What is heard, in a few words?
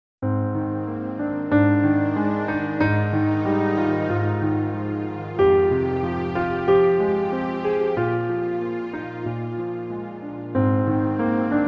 Kawai CA501 slow strings